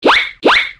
Cartoon Shwink Sounds Car Horn

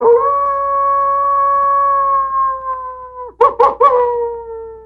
Coyote Sounds Car Horn

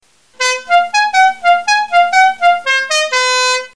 Godfather Sounds Car Horn

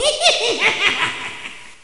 Wicked Witch Laugh Halloween Sounds Car Horn

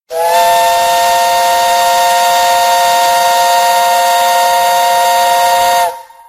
Steam Boat Whistle Sounds Car Horn

